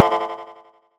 fx alien mystery; neuro; Sinistarr; Synths and FX

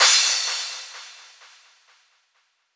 Crash, Drums Cymbal

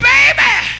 VOX; Zero G; Man Machine

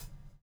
bubble; Spectrasonics - Backbeat

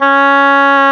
OBOE C#4; Roland - LA Composer vol. 1; WOODWINDS

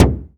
Fricke MFB712 TOM

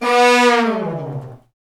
BRS Fat Falls; Roland L-CD702; Fat Section

